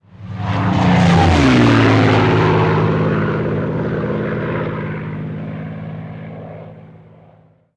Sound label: plane